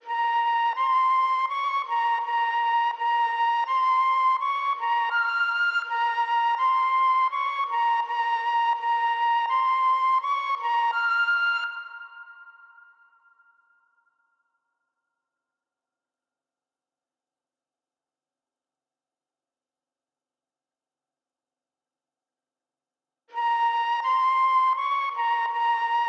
165 BPM A# Min Flute, Loop Stems; Melodies; Cymatics; Domination; 2022 Melody Mystery Expansion

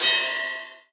House Ride, Cymbals; Drums; One Shots

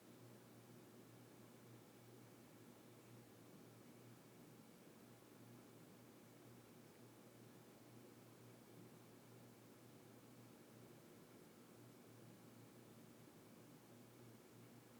Room Tone Ceiling Fan Runs; Fairlight Sound Lib

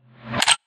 Reverse FX

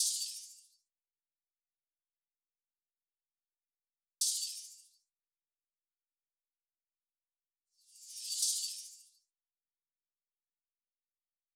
Drum Loop 114 BPM Open Hat; Loop Stems; Cymatics; Vibes II Drum Loop Collection; Toast

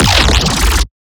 Riddim Bass Hit; Juicy

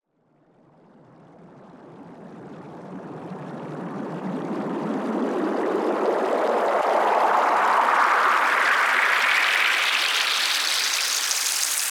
Riser Water; One-Shots; SFX; Ghosthack; Color Bass Essentials

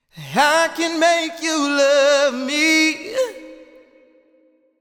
Sung Phrase Wet - 70 BPM G# Min, Vocal; Cymatics; Euphoria Vocal Sample Pack

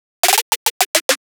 Riddim Drum Fill (140); Ghosthack; Riddim Essentials 2020

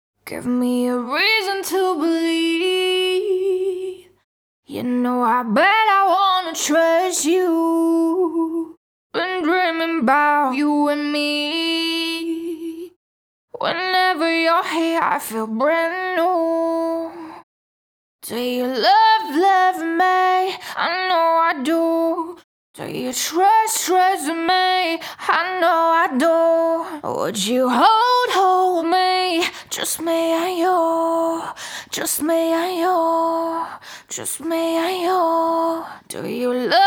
CLEAN LEAD (108 BPM Eminor), Pop Vocals Acapellas; JUST ME AND YOU

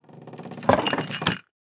Item Wooden Chest Open or Close; Game Essentials

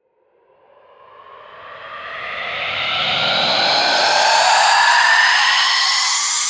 Riddim Riser, Inhale; One Shots; Ghosthack; Riddim Essentials 2020

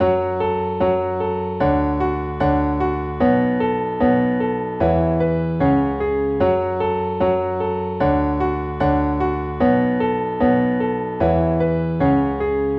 Piano Loop - 150 BPM F# Min; Piano Loops; Future Bass; Instruments; Scarlet

